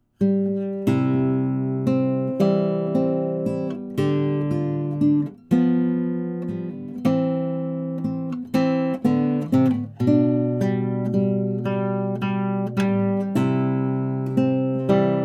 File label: Yamaha GC-32C